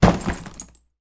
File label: foley luggage suitcase drop on floor; zapsplat